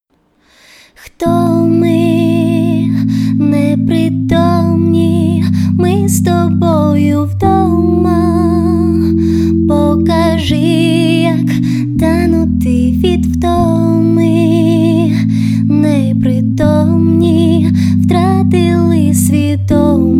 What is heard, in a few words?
piano; 8 seconds piano demo